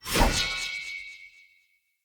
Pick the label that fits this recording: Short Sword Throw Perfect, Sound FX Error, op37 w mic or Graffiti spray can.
Short Sword Throw Perfect